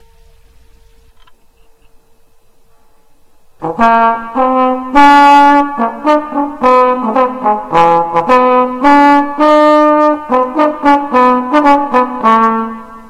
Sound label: trombone